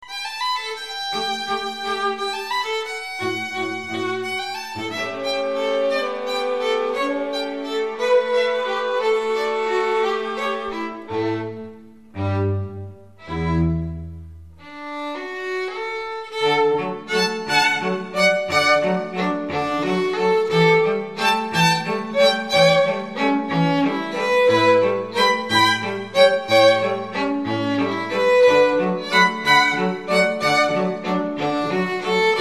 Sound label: Strings